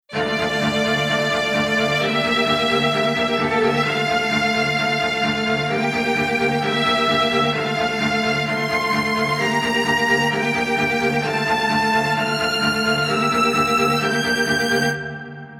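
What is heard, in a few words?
Strings Homophony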